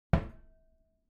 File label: object cabinet wooden door close soft glass clang; smartsoundfx